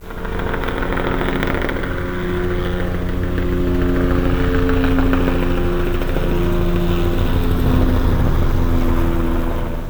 HELICPTR; vision

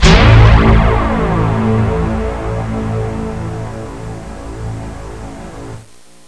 dramatic; Sound Effects for Multimedia - Volume 1

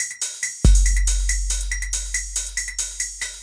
mr-beat

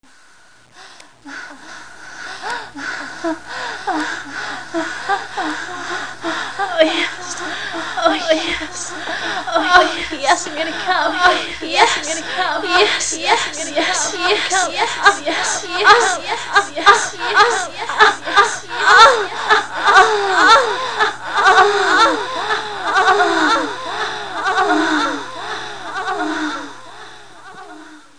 ORGASM; A Taste of Erotica - Edition 2.0

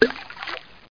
splash; micrsoft; golf

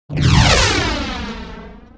explosive missile; Earth and Beyond; EA Games

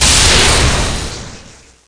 Bolt Shot; Requiem

